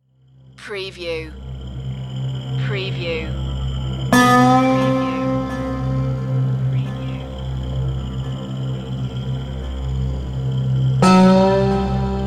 dark swamp

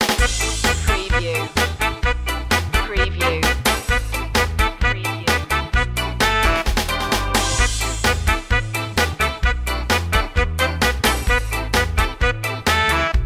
a minor ska

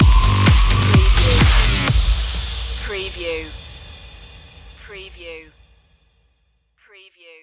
high drive stinger

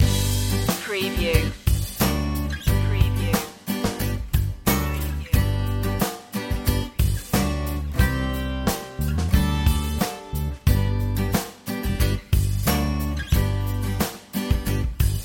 happy journeys loop

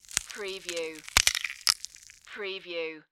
Mummy Limb Rip Juicy; sound-effects-bible

